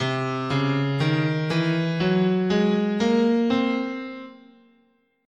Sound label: C Altered, scale